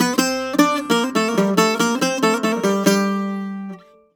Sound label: BOUZ C+5, bouzou